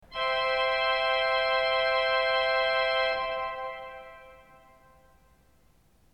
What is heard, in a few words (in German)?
ton, orgel